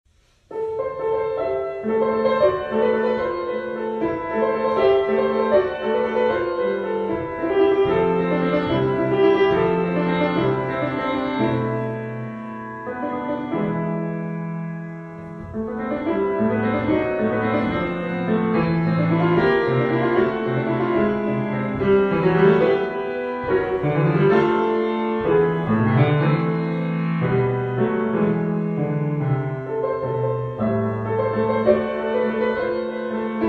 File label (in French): piano Bulles de savon; Le petit festival du piano